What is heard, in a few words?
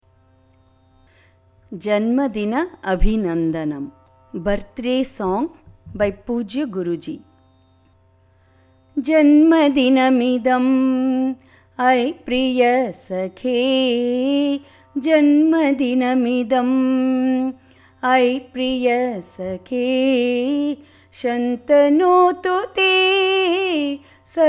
Birthday song; Slokanjali Level I